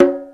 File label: ROCK PERC.